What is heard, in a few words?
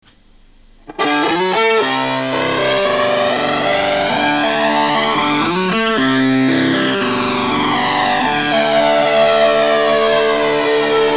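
flanger; מה שמתקבל הוא מעיין גל עולה ויורד שוב ושוב של שני צלילים שרודפים אחד אחרי השני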